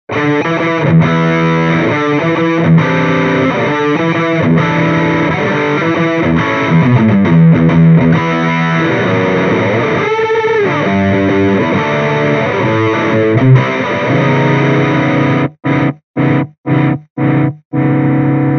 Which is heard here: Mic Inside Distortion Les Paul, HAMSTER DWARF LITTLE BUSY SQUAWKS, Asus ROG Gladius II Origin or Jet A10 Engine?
Mic Inside Distortion Les Paul